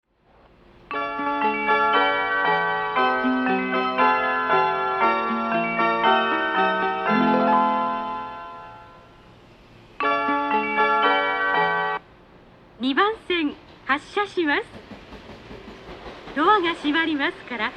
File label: melody, station